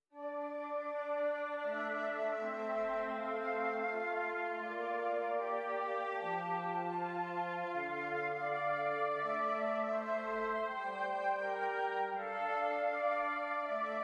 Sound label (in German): Stimmiger Contrapunkt; Ronge